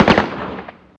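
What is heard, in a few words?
gun ak47 medium; Call of Duty Modern Warfare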